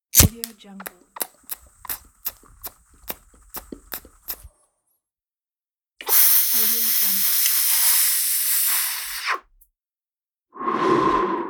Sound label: cigar inhale and exhale